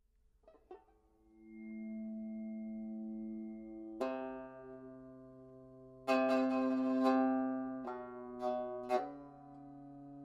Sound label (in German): e-bow melodie, banjo